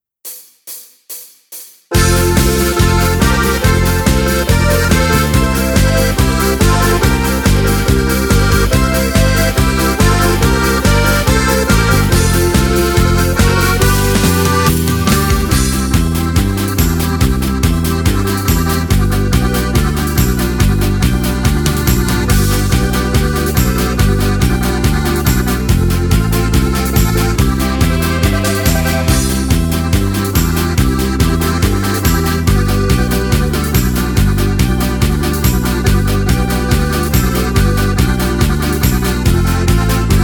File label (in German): Karaoke ohne Chor